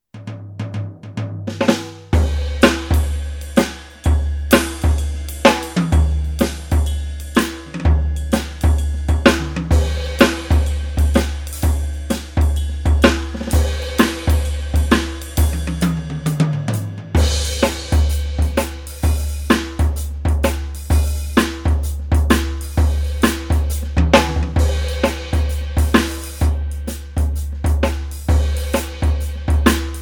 EXS3 Swing Kit Pop Groove; ATV

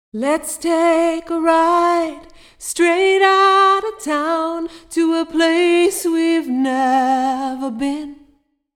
Plate Vocals

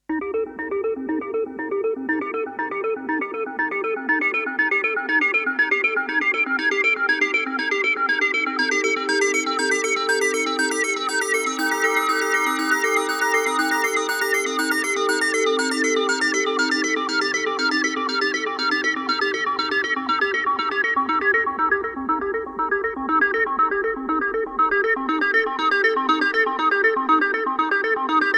Roland JX 08

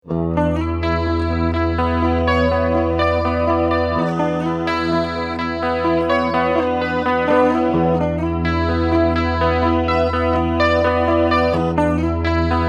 Sound Mode Rich Modulation

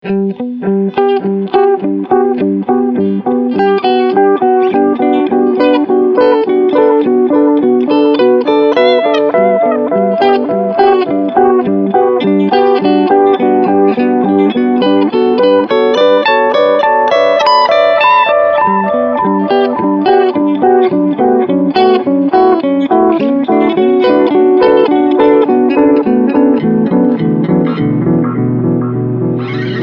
delay

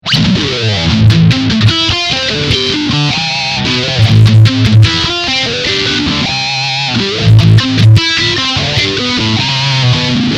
Phase Heavy

